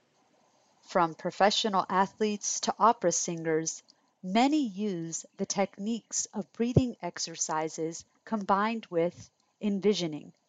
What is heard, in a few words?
Breathing